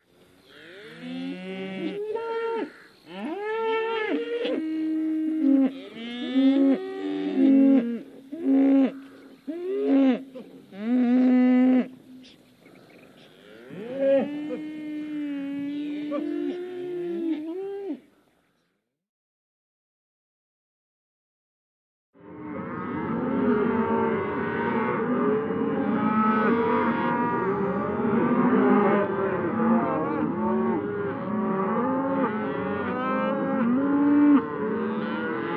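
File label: sounds of a small herd of cows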